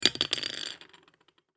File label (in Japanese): えんぴつ 転がし